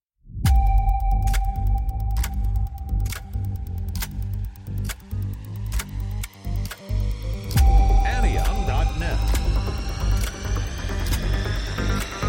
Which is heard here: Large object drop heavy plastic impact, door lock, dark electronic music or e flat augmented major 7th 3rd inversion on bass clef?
dark electronic music